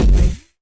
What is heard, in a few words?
distorted band kick